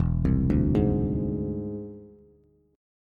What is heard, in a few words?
E Augmented 7th Bass; arpeggiated; 0,3,0,1